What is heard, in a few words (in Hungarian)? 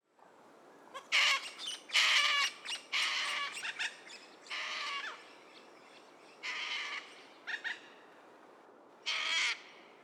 ormanyos kakadu hangja